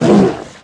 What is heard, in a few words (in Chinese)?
北极熊受伤声